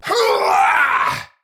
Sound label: Attack Effort, Battle; Warframe; Tau; Dax; Knight